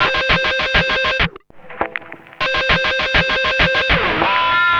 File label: Ugly, Industrial, Nasty